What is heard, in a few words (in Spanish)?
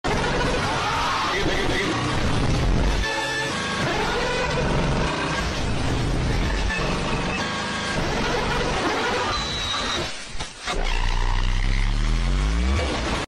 random ruidos